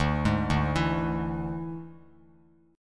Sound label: D Suspended 2nd Flat 5th D Bass Standard; arpeggiated